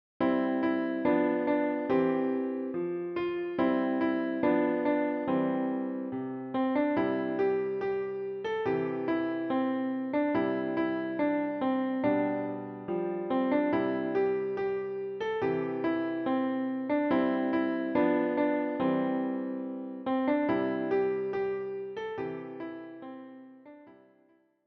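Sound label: piano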